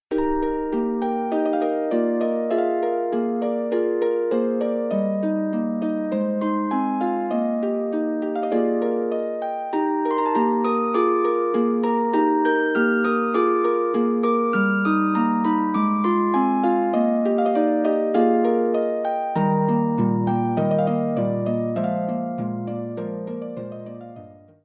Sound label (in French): Sonate pour la harpe